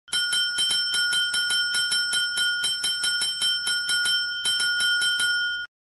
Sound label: bell ring; hector salamanca; breaking bad; made with Voicemod